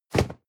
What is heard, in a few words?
cardboard box medium place slide hard; objekte